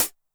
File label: closed hat Med Decay, 909; classic machines; 909mod